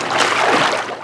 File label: wade, footsteps infected run